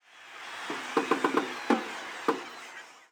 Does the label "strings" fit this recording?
No